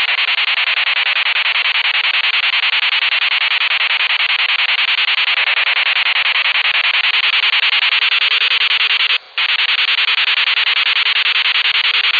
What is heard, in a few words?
car key